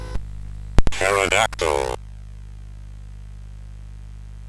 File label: sid CSG8580R5; Cave of the Word Wizard Set10